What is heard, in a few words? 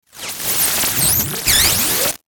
Electronic Blurp Static